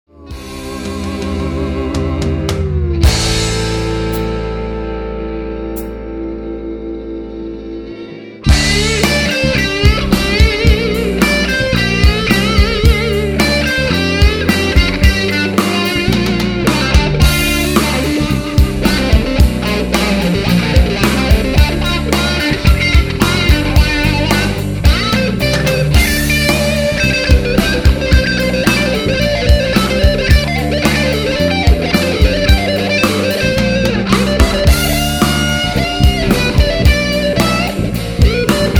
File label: Instrumental